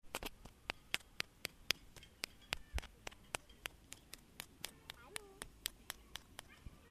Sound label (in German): klopfen mit steinchen